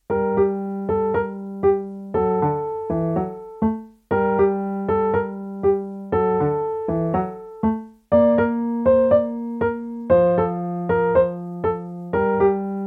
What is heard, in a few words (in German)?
Tango-Plauderei, Endtempo